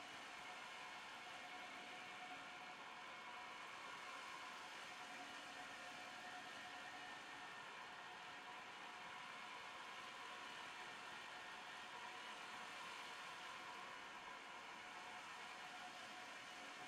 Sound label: Amb Wind Tape-Rewind Loop; Sx Amb Wind Tape-Rewind Loop 01